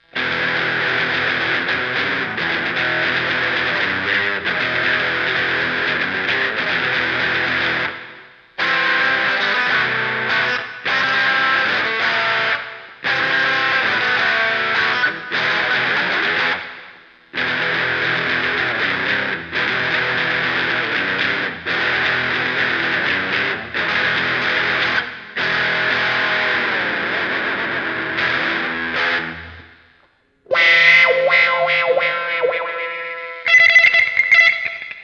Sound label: Grinding Halt electric